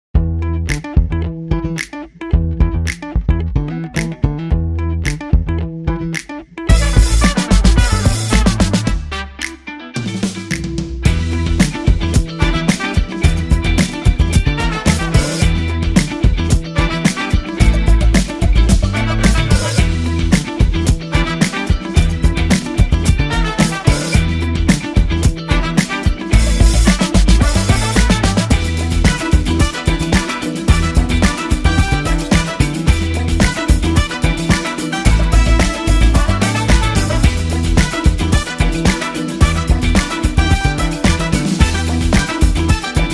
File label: Jazz Funk